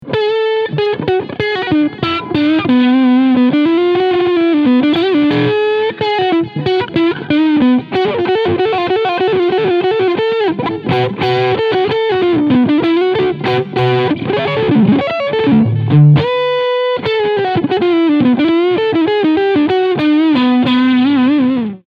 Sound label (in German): Squier Strat Overdrive Lick FrontMitte Singlecoil; 40th Anniv